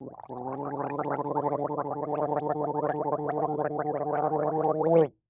Gargling

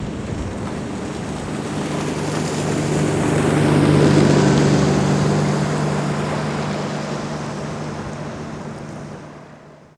115 hp Evinrude Boat Pass By, Slow Mono

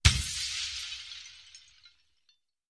Glass Crashes Design; windows & mirrors

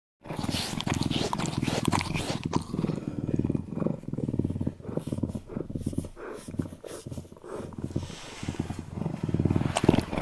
Lynx Purr Grooming

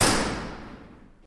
Door Metallic Slam, large

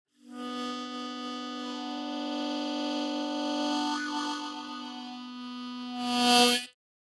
Mouth Organ Vibrato, harmonica; woodwinds

